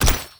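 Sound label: glass break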